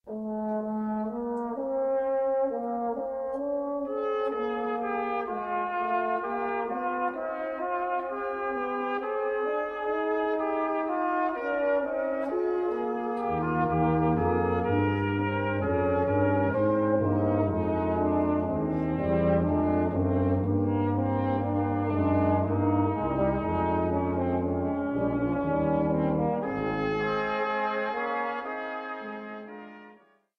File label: Chorale Prelude